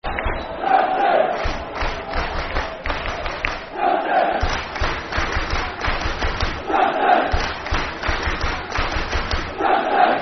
County (Clap) [Football Chant (Soccer Song)], Stockport; Stockport County Scfc